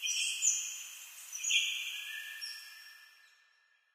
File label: amb african birds; source engine; portal2